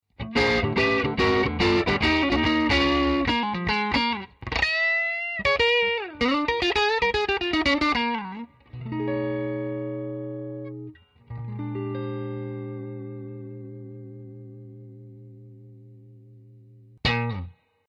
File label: blues